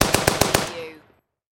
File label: Machine Gun Vickers